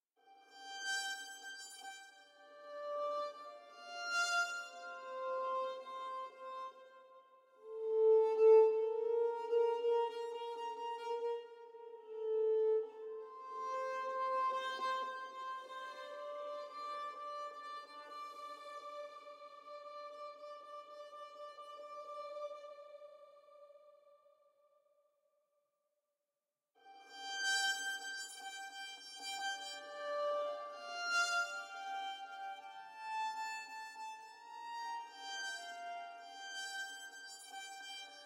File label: mus ambient; opal